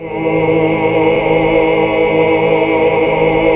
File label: HAL CHRD20DR, chords; Sound & Vision - Gigapack II CD 1 (Roland); HAL Greg.Chords1